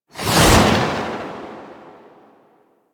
Melee Combo Mode Deactivate; Warframe

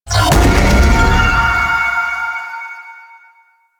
Sentient Aerolyst Shield Up; Warframe; Sentients

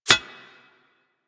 Bullet Impact Armour; Warframe; Weapons

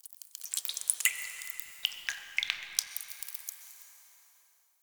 Ocean Cave Drips; Warframe; Grineer Ocean

